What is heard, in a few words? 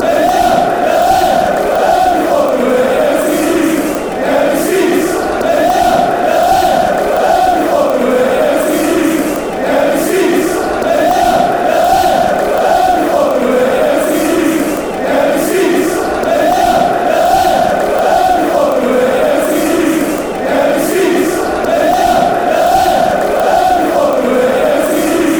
empty seats nufc fanchants